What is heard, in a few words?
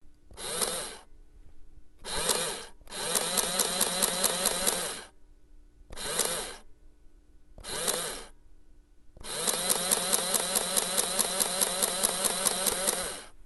Calculating Machine Sound